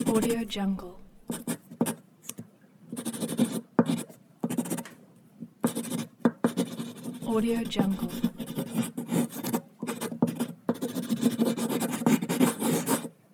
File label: pencil drawing